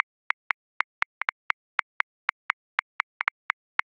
Inbetween Hip Hop, Grooves; Drum Booth; Ableton

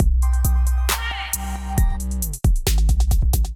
kit groove 135 bpm; MIDI Clips; Miramar Kit Groove; Ableton; Factory Packs; Beat Tools

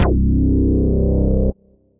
Bass; Ableton; Factory Packs; Build and Drop

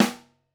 SN1 Overhead, Snare; Ableton Foundation FreePacks; Snare Designer

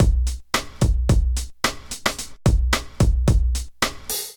Kit 110 bpm, Drums; MIDI Clips; Move Up; Ableton; Factory Packs; Chop and Swing

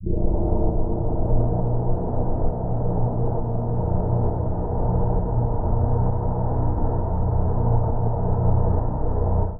Canyon Drone; MIDI Clips; Instruments; Ableton; Drone Lab

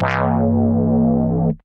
Wobhouse, Bass; Ableton; Skitter and Step

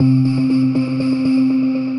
Pad, Synth Rhythmic; Atmostronic Pad; Ableton; Factory Packs; Synth Essentials

